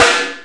AMB SNARE; Greytsounds VOL-1; SDX SNARES

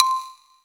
C5, ETHNI PRC, Synth; ETHNLOG

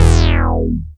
BAS MKS-50; Best Service Gigapack 2

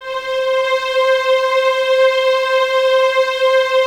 violins C 5, String Ensembles, 23 Violins; Miroslav Vitous

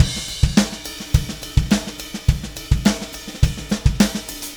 Beat Crash; Session 02 - Clinical

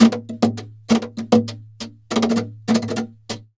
flamenco guitar, duende fx

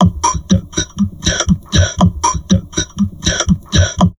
TALK, Drum Loops Crazy Processed; USB Soundscan vol.07; AKAI

